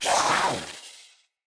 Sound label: Kavat Vocal Attack Medium; Warframes; Khora